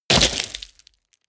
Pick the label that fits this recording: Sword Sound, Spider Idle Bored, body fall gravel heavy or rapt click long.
body fall gravel heavy